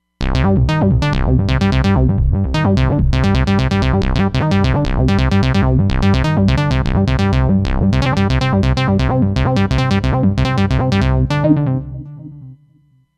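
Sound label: Acid Bass; Roland Fantom XR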